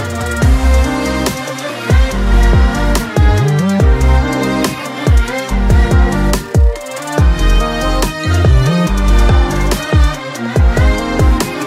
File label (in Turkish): Zil Sesi Sozsuz